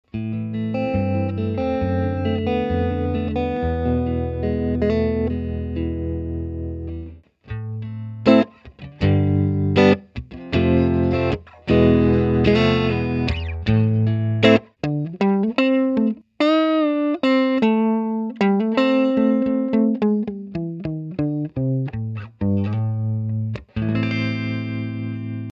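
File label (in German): Ampeg Jet 12 Gitarrenverstärker; Mikrofontest; A/B Vergleich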